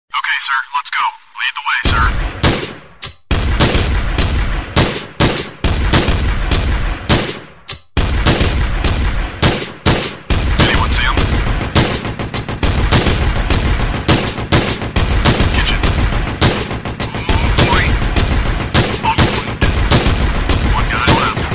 Weapon Beat

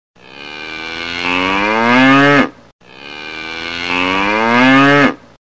Cow Sms

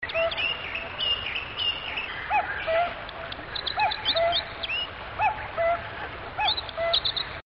Bird Sound

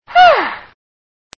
karate

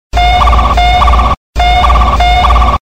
Funny Sirens

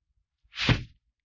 Swish Broom Hit

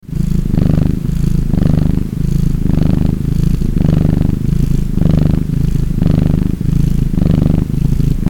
Sleeping Lion

